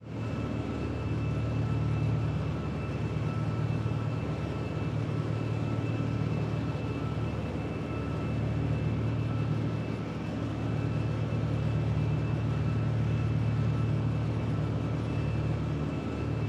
ship engines; World Of Warships

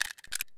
grach slider jammed; Escape from Tarkov

